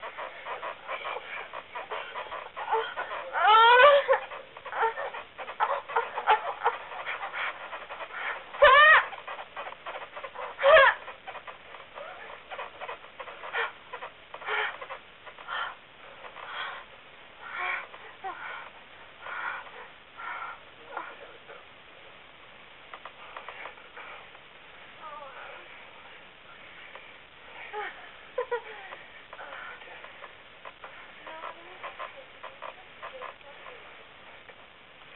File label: sqeaks and cries, Silly Lo-Fi Orgasms